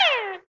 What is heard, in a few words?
air out, Zaps and Beeps